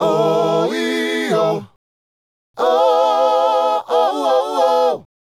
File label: Male Group, Hip-Hop and RnB Vocals